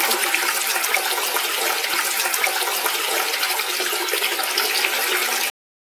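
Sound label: flush loopable; soundscrate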